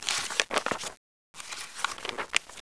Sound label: LIBRARY; Caesar III